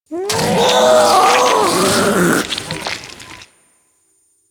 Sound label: Ancient Shell Attack, Infested; Warframe; Ancient Shell Agent